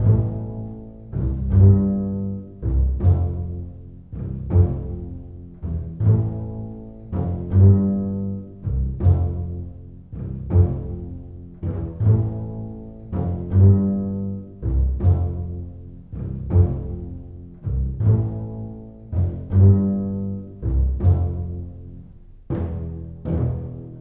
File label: bass pizz